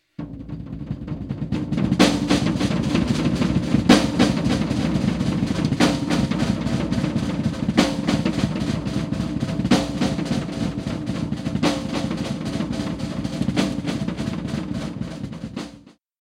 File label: Snare